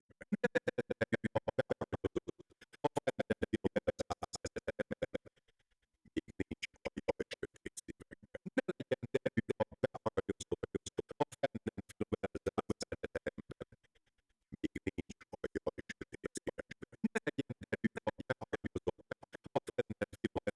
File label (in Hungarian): variacio arp